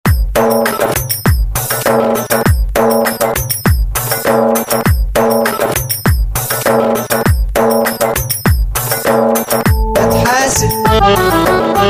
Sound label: without autotune